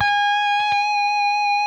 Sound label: PRS lead; Spectrasonics; Hans Zimmer Guitars VOL-1